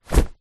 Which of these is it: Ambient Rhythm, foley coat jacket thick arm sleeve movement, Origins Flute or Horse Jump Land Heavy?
foley coat jacket thick arm sleeve movement